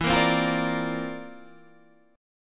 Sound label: C 11th E